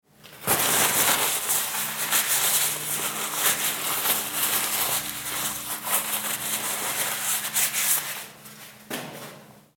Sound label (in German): Papiertuecher